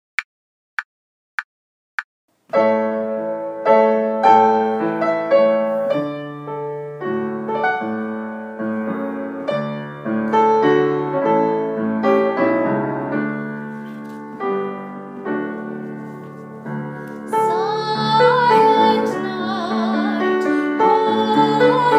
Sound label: Silent Night vocal guide; carols backing track